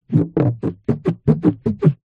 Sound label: balloon rubber stretched out rub squeak